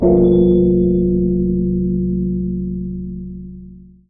time warp; osu

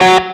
staccato; osu

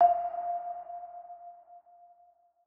Bell

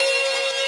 synth main

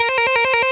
trill

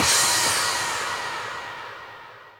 normal hit finish; osu

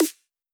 snare a4

